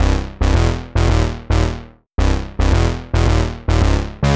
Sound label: Bass Line; Eclectic Electronica